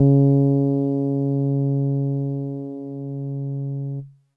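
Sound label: E Acoustic DX100 C#2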